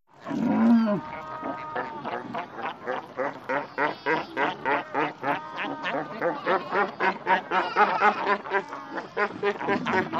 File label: Seal Cape Fur Barks, seals & sea lions